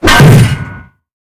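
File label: tank ricochet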